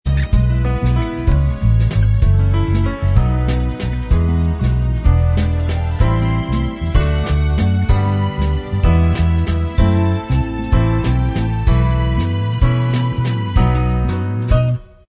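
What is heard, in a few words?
instrumental, backing tracks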